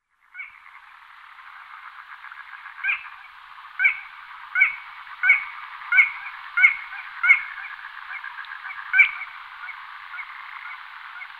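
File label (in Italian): schiribilla, Canto degli uccelli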